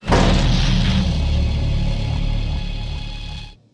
door

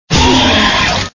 scream

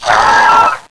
pit worm flinch

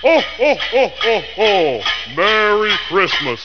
stadium; svencoop2

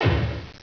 click

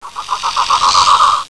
pit worm idle

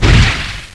pit worm attack eye blast impact

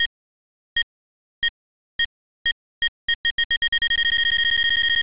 blip

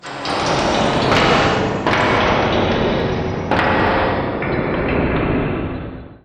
door

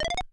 item get; keen

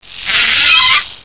pit worm attack swipe

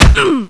spank orgasm

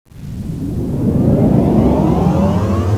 power on

